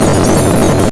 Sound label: freezer ice